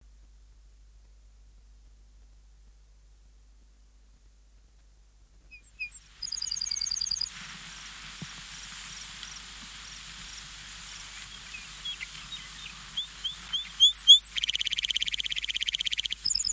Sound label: lark bunting, songbirds